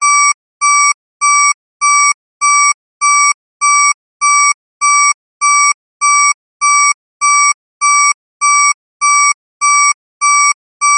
alarm siren small digital beeps slow

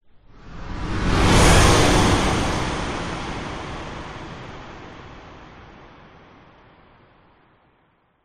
whoosh; orlando lanzini

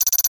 technology data read out burst

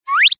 cartoon slip trip high pitched fast

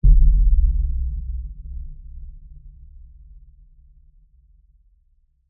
cinematic lfe sub bass thud boom drop; zapsplat

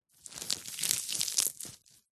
food spinach leaves crush squash twist; zapsplat

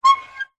industrial lever pull squeak light

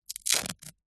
household band aid plaster peel off skin; zapsplat

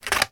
office scissors cut card single; sound effects one

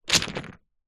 leisure jenga tower fall over carpet

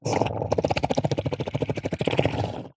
cartoon slime bubbles; zapsplat

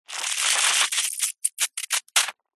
foley liquid foamy squirt into short fine spray

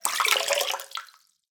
water basin bathroom movement; zapsplat

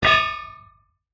industrial manhole cover metal close

